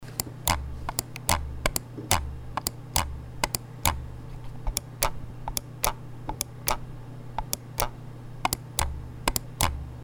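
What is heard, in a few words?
Logitech G300s Click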